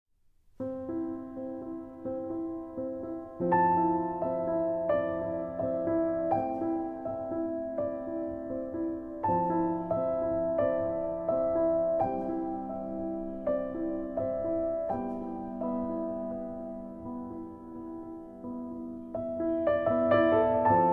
Solo Piano

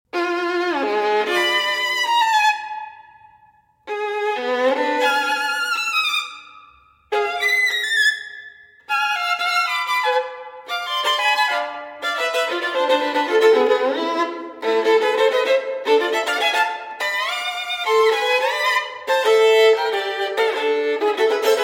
Sonatas For Violin Solo, Sonata No. 2 in A Minor; IV. Les Furies; Ysaye; Op. 27

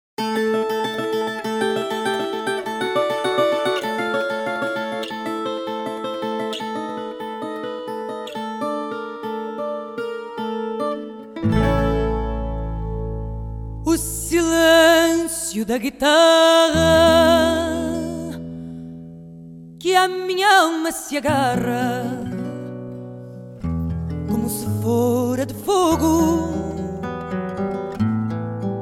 Guitarra

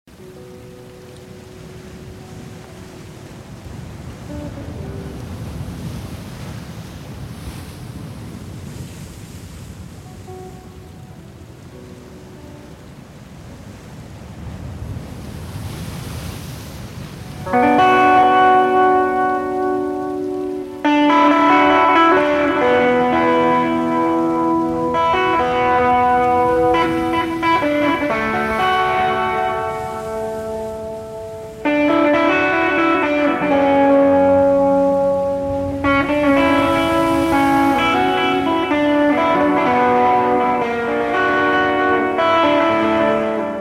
Guitar Solo

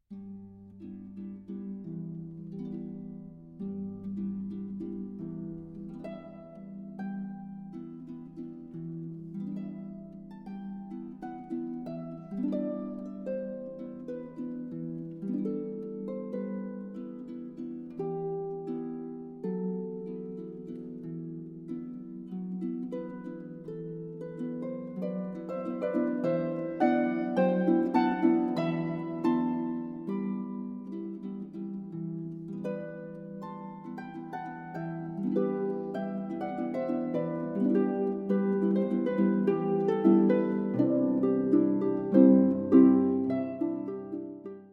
Harp Sonata Lento

